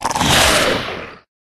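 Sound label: Wild Flower attack bottom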